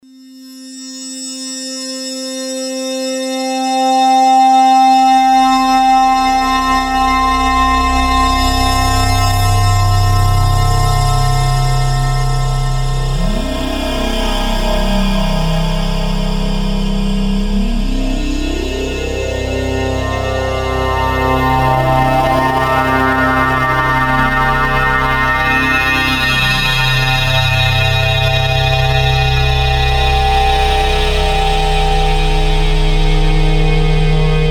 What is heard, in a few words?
Waldorf Quantum